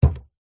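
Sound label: foley footstep single on wooden floor barefoot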